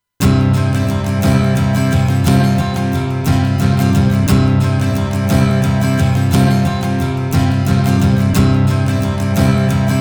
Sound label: Guitar Tube; Universal Audio 710 Twin Finity